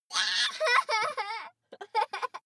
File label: child laugh tickle strained